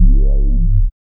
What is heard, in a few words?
BASS